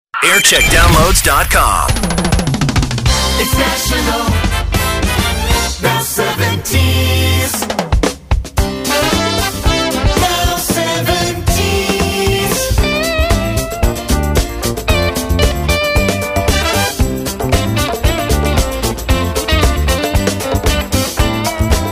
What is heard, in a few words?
Jingle Montage; Now 70s; JAM; 2020